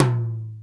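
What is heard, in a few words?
Hi Dynmic, Alesis D4 drum machine tom; 300 Drum Machines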